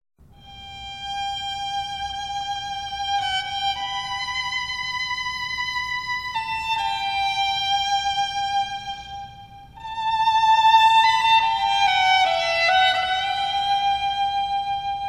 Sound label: Ave Maria by Schubert Solo Violin